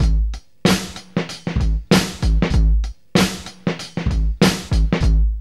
FLY LOOP 96, Funky Elements LOOPS; Zero-G

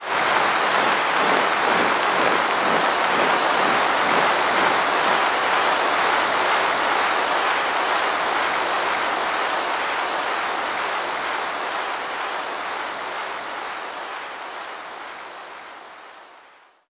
Applause Wave; Roland BK-5

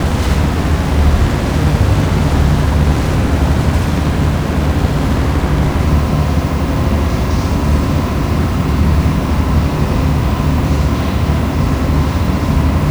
Drone Ambient; Drums & Drones

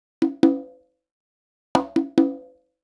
Percussion, Cinematic Funk Urban; Sonic Foundry (Sony Creative Software); Cinematic Funk Urban Tone Poems

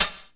hihat, Emu Sp12

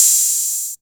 HOUSE DRUM HO; AKAI S6000 CD-ROM - Volume 1; DRUM RYTHM

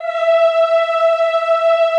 Classical Choir, E4; Best Service; Extended Classical Choir

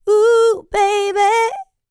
licks, vocal, dance; ILIO; Vocal Planet

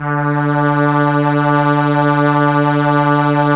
STR ARP, ARP Strings, ARP Slow Str

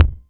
Kick, Roland MC09

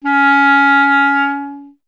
clarinet C#; Miroslav Vitous; Solo Instruments VOL-1

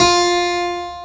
8FT F4, HARPSCH; AKAI S-Series CD-ROM Sound Library VOL-2

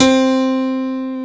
PIANO, Pianos Mixe

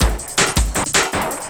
Drum & Bass, 160 BPM

